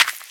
footstep dirt; Scrat

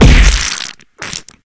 Kill Hitsquish; friday the 13th killer puzzle

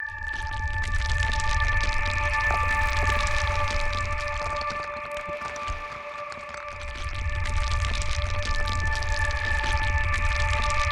Stone Gate Ambience; dust an elysian tail

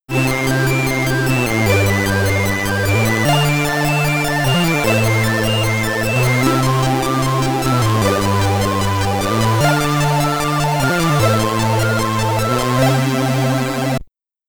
SID Stereo, commodore 64; Jocky Wilson's Darts Challenge

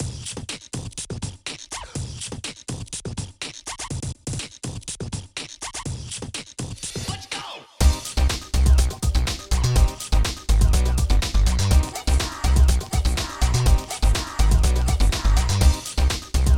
Beatbox (Instrumental)

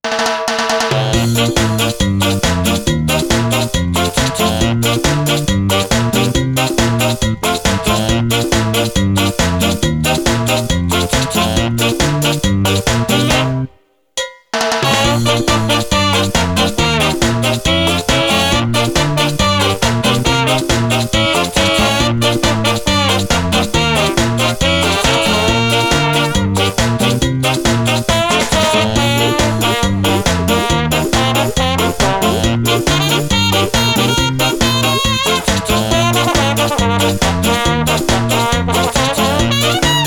Ska Ska; DanceDanceRevolution X; arcade; PS2

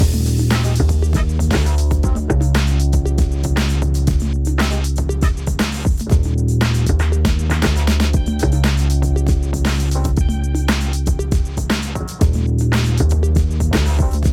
90 hiphop 118bpm; gamerip; Hot Lava

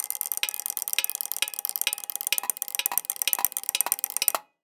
mechanical gadget

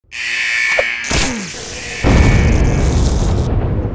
Grenade + Taser

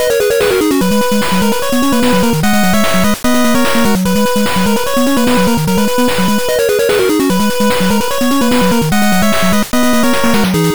8bit Select Loop; super weekend mode; macos ps vita ps4 switch windows xbox one gamerip 2018

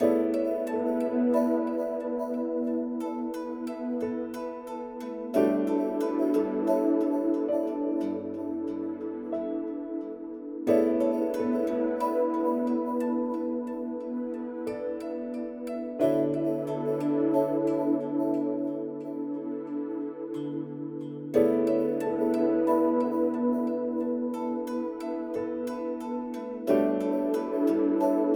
menu

